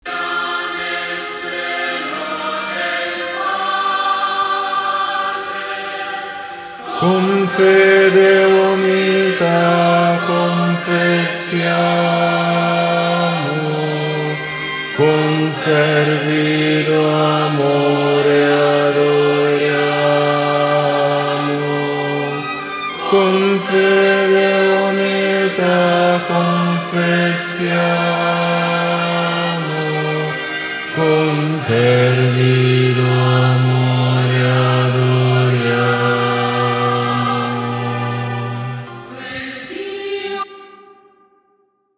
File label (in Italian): Contralti Bassi; Quel Dio